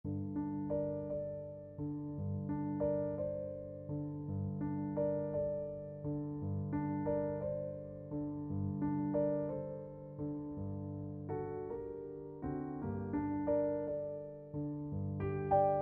accompaniment

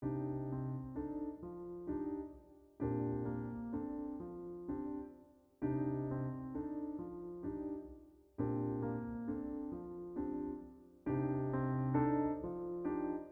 trad south african accompaniment

